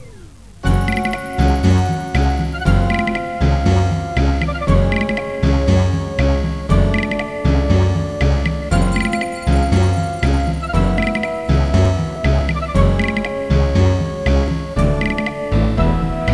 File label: Arabian Beat